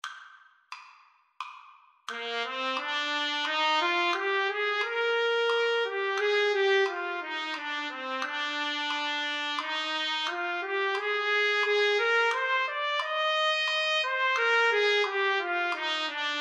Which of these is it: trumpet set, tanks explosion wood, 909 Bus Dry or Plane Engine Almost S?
trumpet set